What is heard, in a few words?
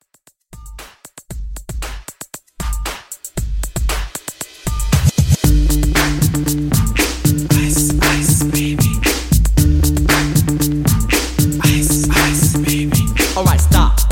80s Hiphop Rap Redrum